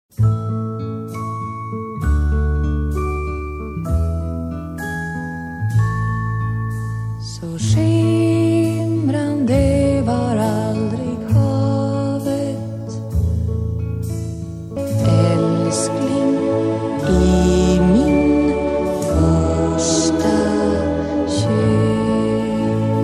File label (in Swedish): Hafsmelodi